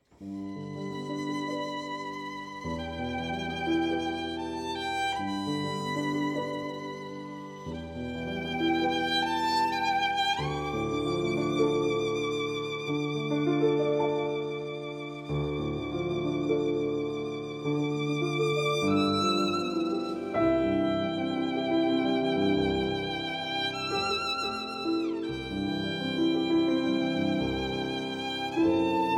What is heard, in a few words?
Violin and Piano; Petite Suite, L. 65 No. 1, En bateau; Gaston Choisnel